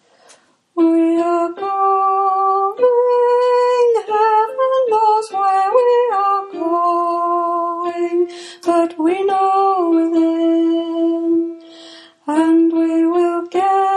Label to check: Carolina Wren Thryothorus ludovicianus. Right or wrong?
Wrong